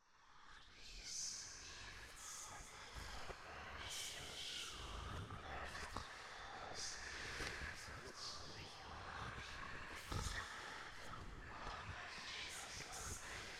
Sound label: Passage Ambient; children of morta; Tempel Level